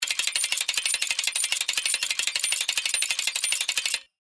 Steampunk Robot, Scifi, Steampunk, Robot, Mechanical, Spider; Science, Fiction